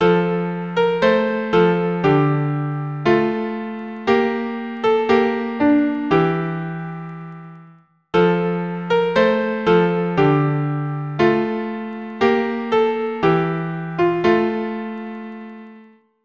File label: Bleak midwinter, keyboard